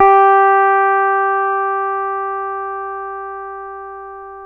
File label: G3, LAP STEEL